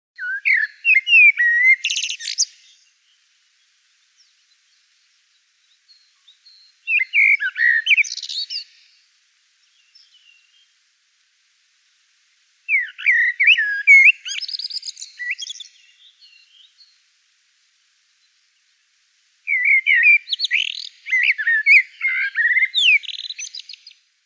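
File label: blackbird voice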